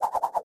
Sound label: wind Wild Spin; elements